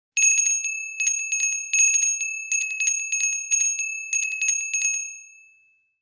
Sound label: Small handbell ring long version